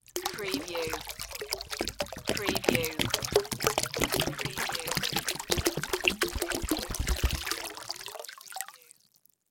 Chlorine Pour Into

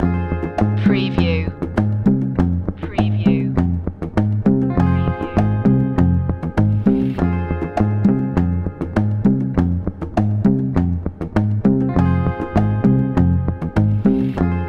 cumbia libre loop

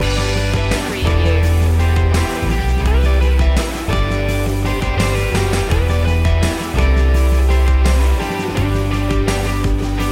pop anthemic song loop

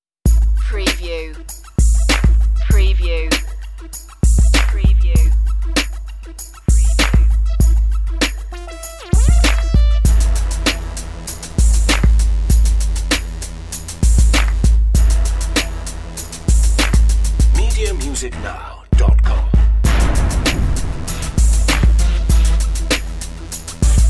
jersey instrumental

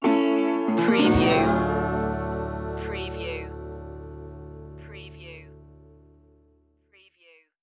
barbed wire blues stinger

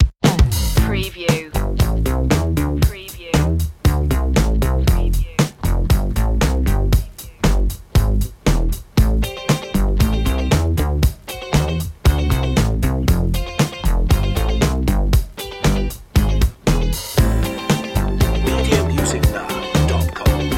boogie disco

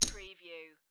Poker Chips Drop One Chip Onto Another Chip

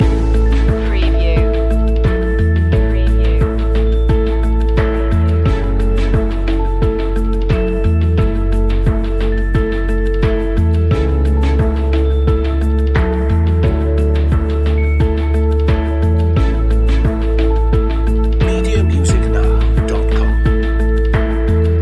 in a warm place loop

